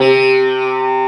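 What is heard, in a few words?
SITAR wave; SITARWV; CMP - Analogon; RESO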